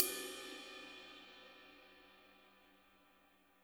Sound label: ride, warm ballad; Spectrasonics; Burning Grooves CD2